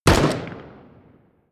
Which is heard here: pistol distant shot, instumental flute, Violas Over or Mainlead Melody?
pistol distant shot